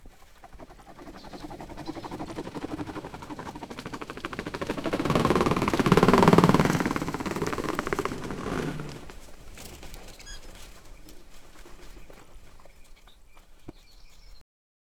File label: tiger with saddle on back, closeup